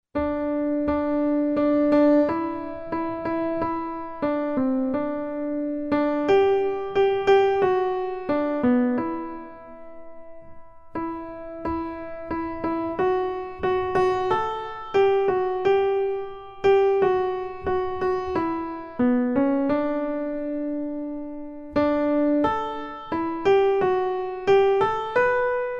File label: melody